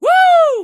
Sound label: woo